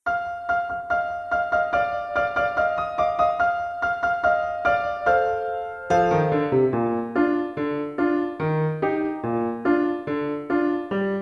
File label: playtime accompaniment; elementary solos accompaniments